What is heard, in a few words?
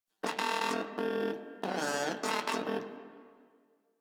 Moa Aggressive Vocal Battle Ends; Warframe